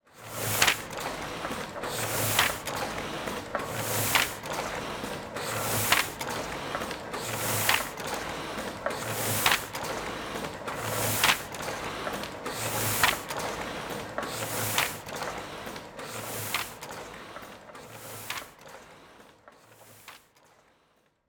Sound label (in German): Druckmaschine; Pritzwalk